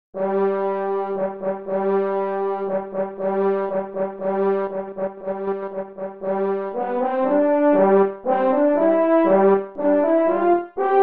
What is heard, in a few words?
Easy Classic Duets Grand Waltz (qn=120), French Horn